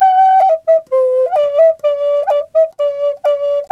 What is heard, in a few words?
didgeridoo riffs; Big Fish Audio - Didgeridoo and Other Primitive Instruments; FLU RIFFS 1